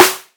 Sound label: noisy snare single hit F sharp key